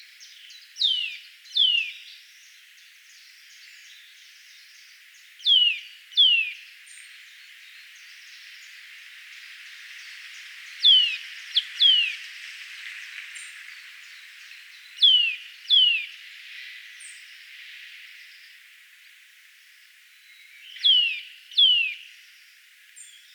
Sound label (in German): kleiber gesang; vogelstimmen; nabu; lars lachmann